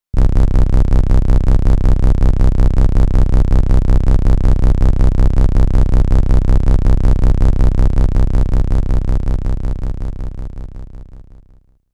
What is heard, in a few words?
Player Tank - Idle